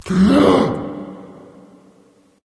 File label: nv breath; Smite; awilix